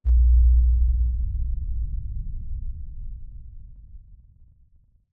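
Explosion Rumble Exterior Distant low frequency effects; Boomtown; Titanfall 2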